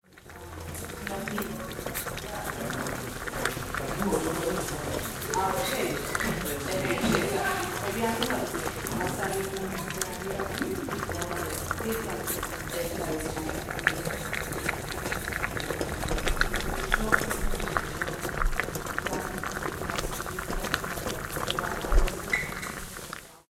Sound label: ambient water boils; syria street